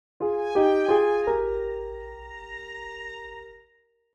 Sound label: Video game reward chest opening romantic